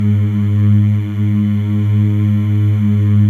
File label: MALE MMS, Symphony of Voices; Spectrasonics